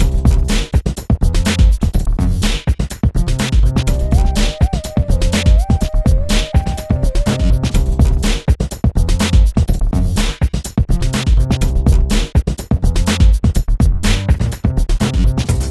core instrumental

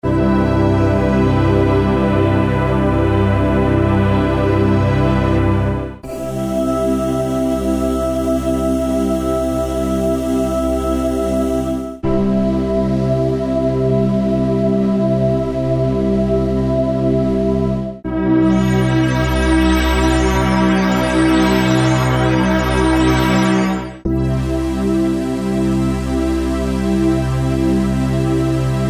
ethereal padz; DSK

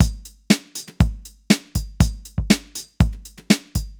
beat retro film tone

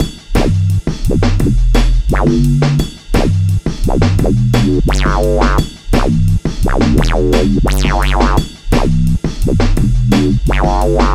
miniboom

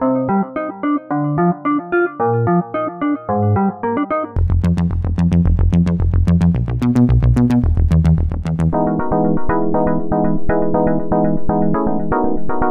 free loops; rekkerd

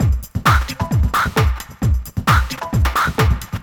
paulstretch beat clean; stretched; Paul's Extreme Sound Stretch

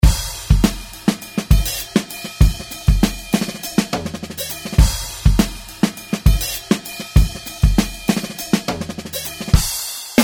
drumz akoustik; DSK

